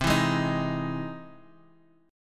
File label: E Augmented Major 7th C